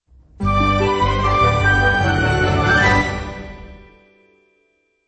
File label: win